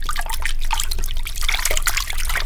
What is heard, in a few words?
Fluid Mouvement Fast; Puddle; puddle plus ios gamerip 2018